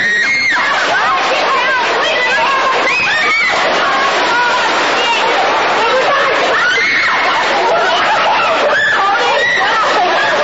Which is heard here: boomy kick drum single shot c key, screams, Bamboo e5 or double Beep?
screams